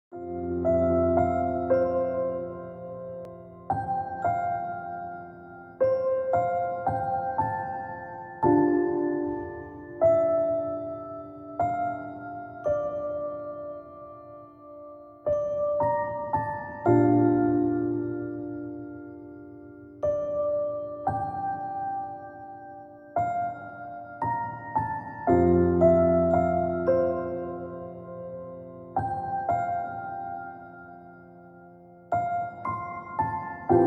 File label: Piano Relaxation